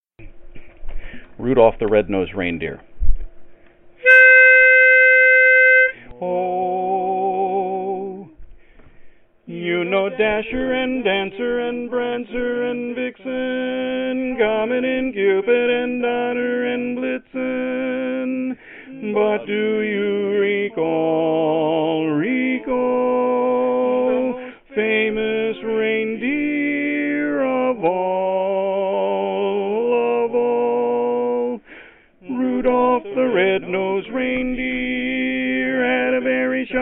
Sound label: Baritone; Christmas